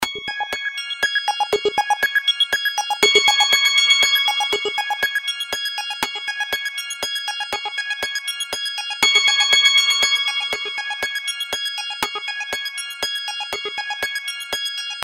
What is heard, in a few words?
Abstract, ringtones